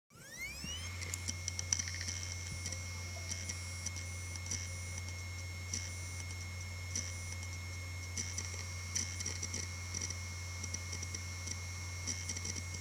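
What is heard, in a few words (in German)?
HDD HGST 500GB, HDD macht merkwürdige Geräusche; HDD von Nachbar macht merkwürdige Geräusche und wird nicht erkannt; LapTop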